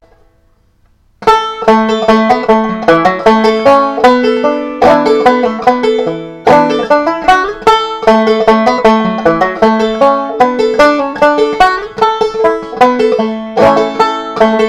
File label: banjo